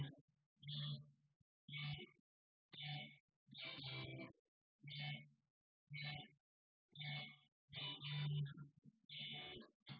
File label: wdrums synthconv; SiSEC08; SiSEC underdetermined